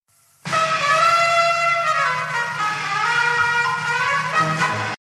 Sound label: Mexican Sms Tone